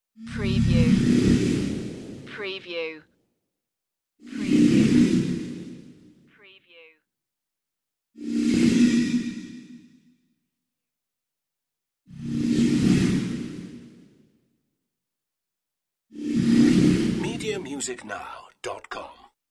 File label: blurred space flybys